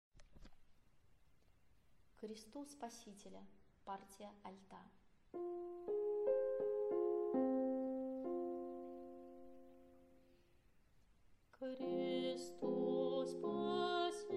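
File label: alto; K krestu Spasitelja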